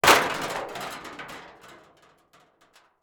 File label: Crashes Metal Fender Hitting Fender